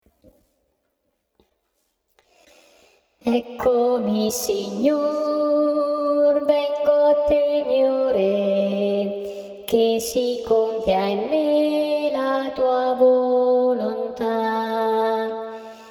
soprani

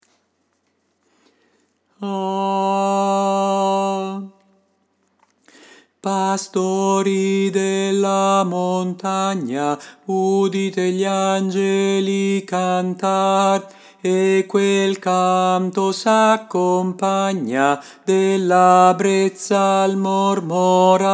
angeli nelle campagne, c. alti

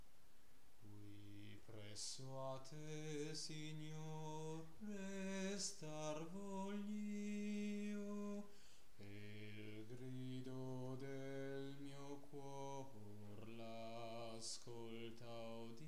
bassi

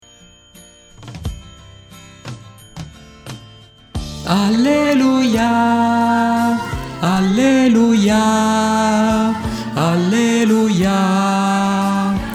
alleluia ed oggi ancora, soprani

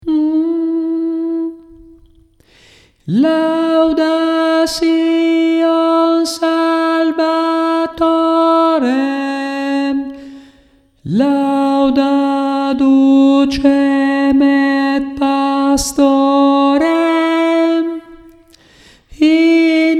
contralti

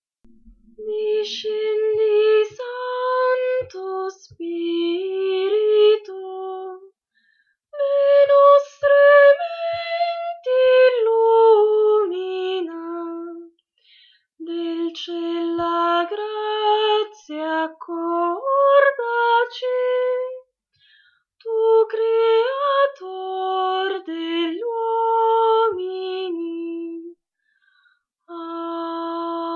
soprani

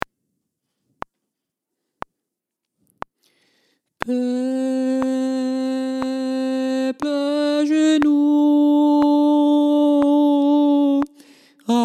cantique de noel, tenori

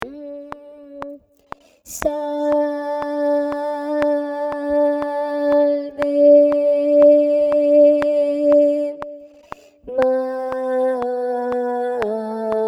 salve regina, contralti

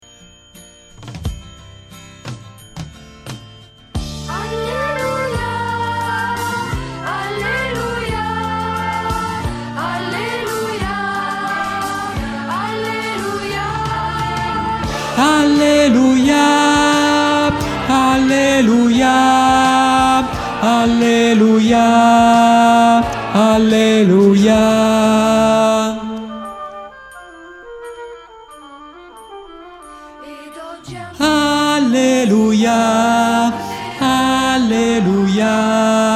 alleluia ed oggi ancora, tenori

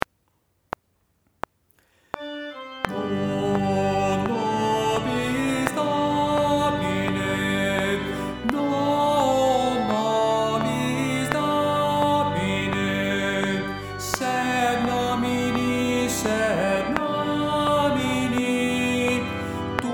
non nobis uomini

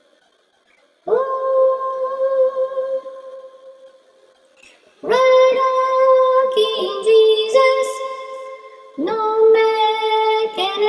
soprani

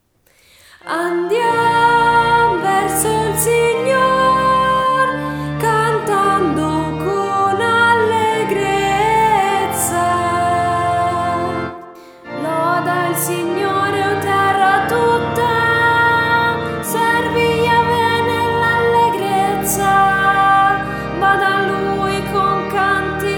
salmo 99 andiam verso il signor soprani

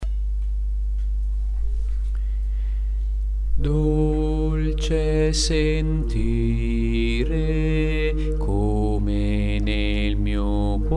bassi

